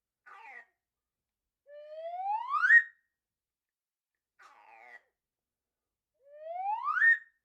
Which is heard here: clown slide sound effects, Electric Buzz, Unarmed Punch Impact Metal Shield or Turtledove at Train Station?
clown slide sound effects